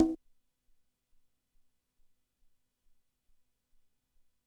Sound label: Conga lo, Drum Machines, ELKA X1000; 300 Drum Machines